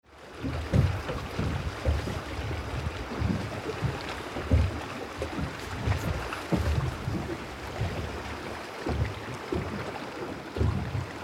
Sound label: Small Boat Off The Coast Of Ithaca Greece; On a small boat off the coast of Ithaca, Greece on 2nd August 2024; While anchored at one of these cov; We rented a small boat for the day to explore the island. We found several coves only accessible by sea